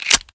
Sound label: reload fast empty magin; xmag; gmod; pi mike